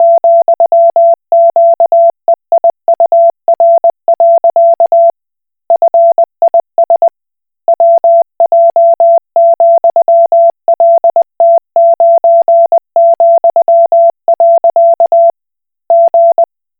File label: Koch Morse